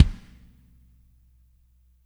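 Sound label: warm kick left, NATURAL KIT; Spectrasonics; Burning Grooves CD2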